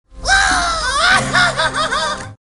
Mobile Ringtone

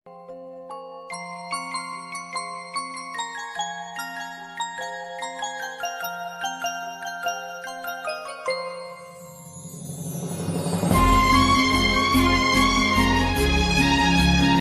Mobile Ringtone

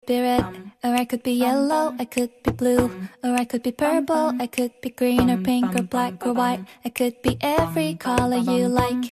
Baby Music

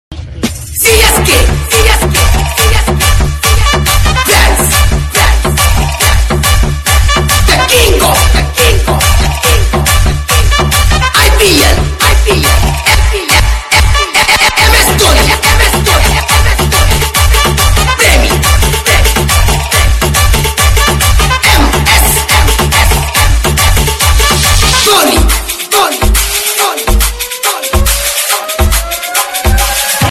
Mobile Ringtone

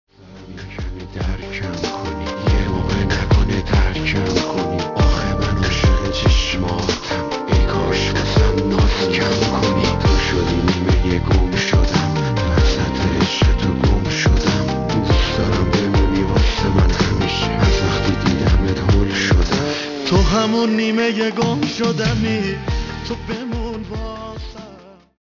Mobile Ringtone

